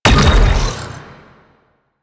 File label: Octopede Doom Pulse B, Sounds Enemies; Warframe; Man In The Wall